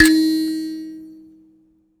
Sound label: Finger, Prepared Kit; Battery 3 Sample Kits